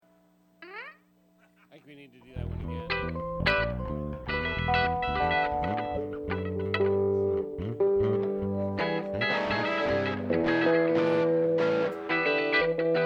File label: Strings Mix